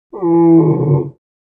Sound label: Elephant Growl Singl